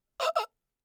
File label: death gasp pained gasps short inhales